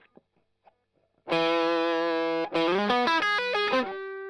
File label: Strum Line